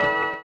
orient, bizarre guitar; Spectrasonics

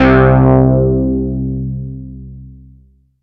ROLAND 700; Rubber Chicken Software Sample Bank

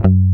GUIT Mute F#1; Best Service Gigapack 2

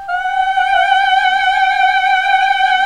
MMH G3, CLASSIC VOX

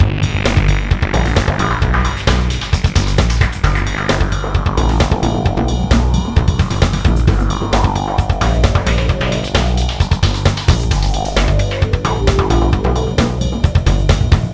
Hardcore Breakbeat, 132 Gmin; Big Fish Audio - Hardcore Breakbeat Action

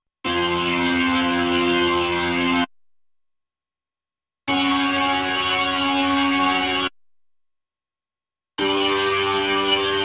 B3 Organ; Masterbits CLIMAX 8

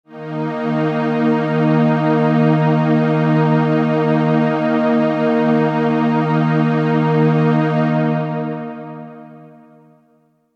Synth Pad D#; Sonic Foundry (Sony Creative Software); Techno Synth Loops 1 Signals I

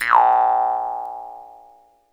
jaw harp, Pitched Percussn, Jaw Harps

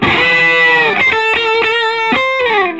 Vintage Blues Guitar, SOLO; USB Soundscan vol.22; AKAI

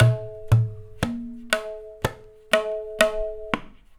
tablas, Indian Traditions; USB Soundscan vol.45; AKAI

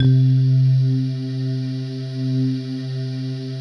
PAD, DIGI-PAD

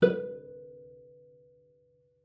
B4, CELLO PIZZ; Xsample VOL-5

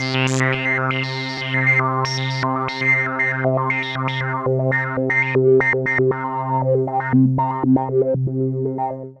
S+H C3, SYNTH; AMG; Global Trance Mission VOL-2

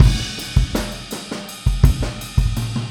Boogaloo Fill Kick, 82 BPM, Drums

